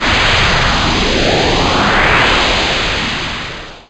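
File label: SYN-FX, synth; E-Lab; X Static Goldmine 1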